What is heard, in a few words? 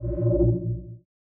space shield sounds